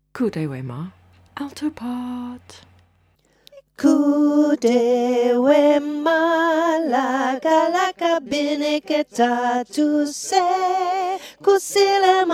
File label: alto